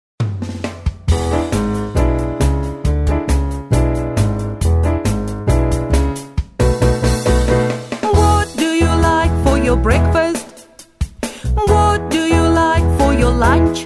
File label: Bananas Blues